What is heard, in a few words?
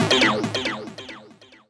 TECHNO EXTRA 140